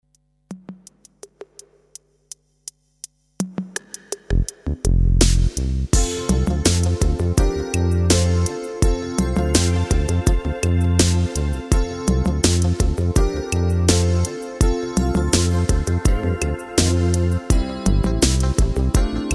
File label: Snow Beat; tyros2; Christmas